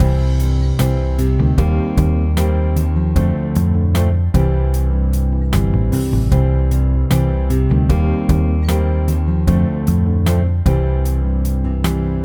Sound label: background; Ashampoo Movie Studio; birth